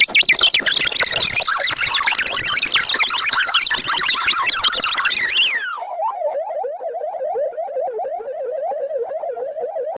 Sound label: add ultra satisfying asmr, slicing